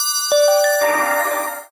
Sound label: win; Amatic; Casanova